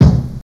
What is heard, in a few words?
Misc Kik, Drum Machines